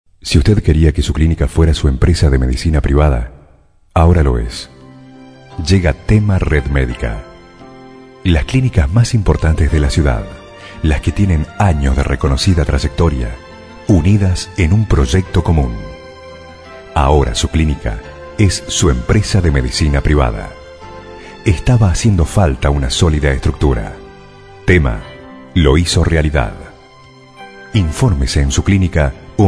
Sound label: ringtone